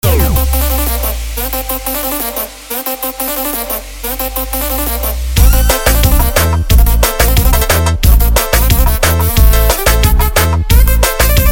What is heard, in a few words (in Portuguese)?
SOLO DO ROCK DOIDO